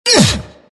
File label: pain; tf; skeleton king